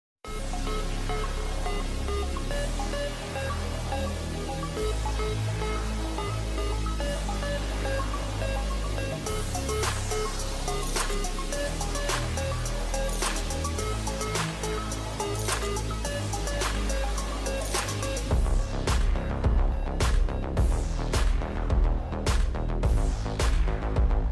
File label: instrumental slowed reverb tiktok version